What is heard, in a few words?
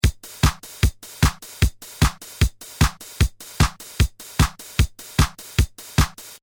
Rhythm Pattern Original, Tr 505; Circuit Bending a Tr 505